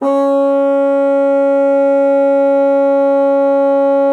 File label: bassoon, C#4; Xsample VOL-6